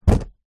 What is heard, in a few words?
foley cardboard box small down on carpet